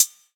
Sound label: original closed high hat sound g key, Royality free hi-hat sound tuned to the G note.